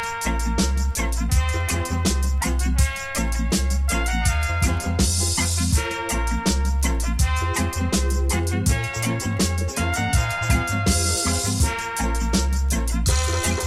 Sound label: horns